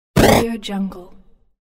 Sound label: glitch